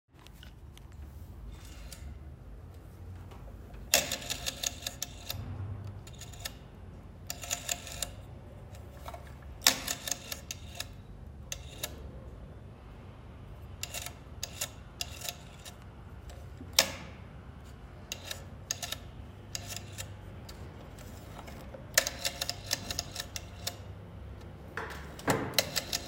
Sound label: old phone